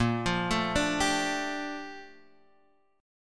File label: A sharp Major 13th, chord; arpeggiated; chordshape; 6,8,7,7,8,x